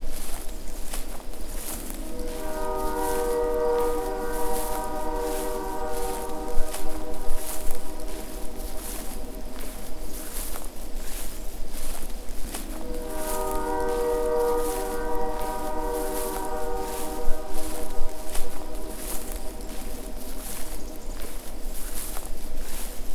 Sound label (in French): train feuilles